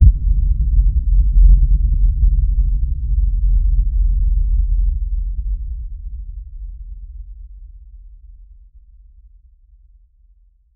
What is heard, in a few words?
the deep bass rumble of